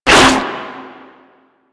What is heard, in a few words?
Proto Infested Runner Arm Attack; Warframe; Abilities